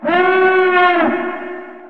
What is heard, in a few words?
alarm